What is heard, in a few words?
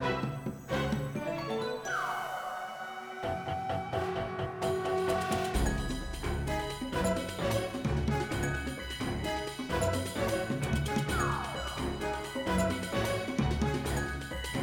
Field Battle Cave Hard